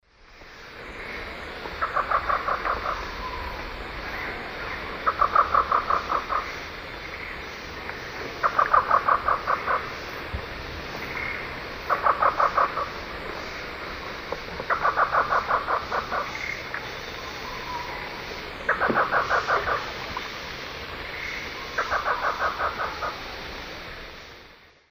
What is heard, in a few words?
Trogon melanurus, calls; S. America; Eastern Lowlands